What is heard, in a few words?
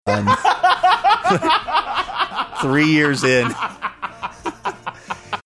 having a good laugh